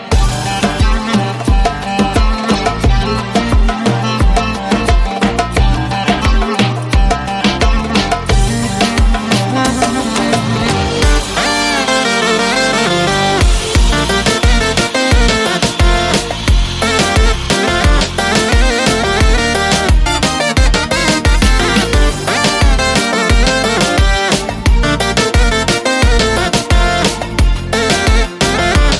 Instrumentala Turk

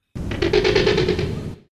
Velociraptor

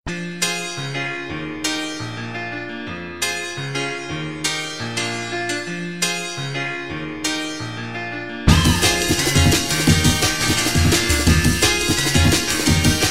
Dream Frequency

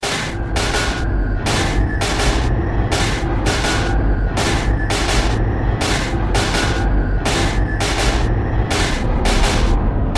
Scary Melody

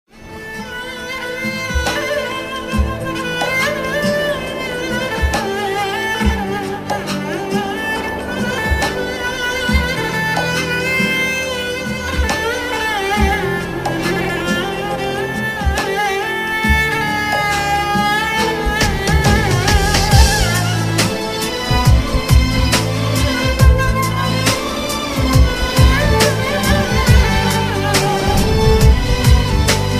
Sad Violin

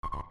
chat sound; Half life

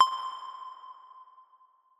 Low Saw Notification

